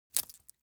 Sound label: object bone break small bright